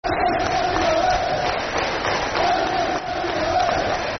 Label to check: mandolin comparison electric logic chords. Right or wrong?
Wrong